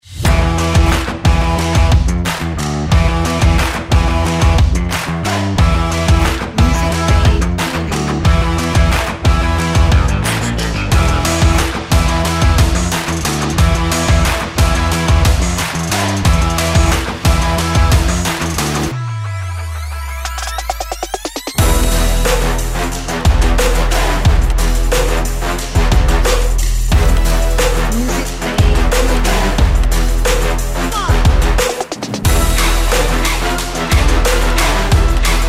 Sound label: Action Sports Trap Trailer